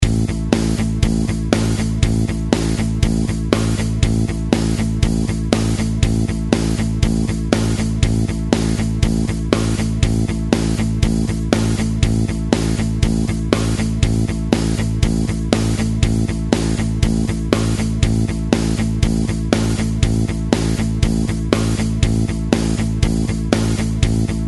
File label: practice loop a straight 120bpm, Simple guitar practice / jam along loops in the key of A